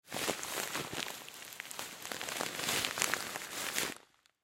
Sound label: Rock Salt Bag Move, Foley Rock Salt Bag Handle Movement Long; Materials